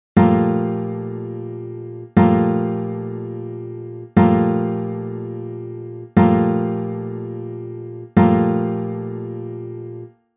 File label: chord